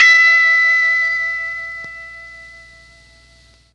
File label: E4 guitar, MKII mellotron